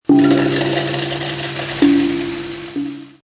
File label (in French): bruit; MOBICLIC 62; ZOOMZOOM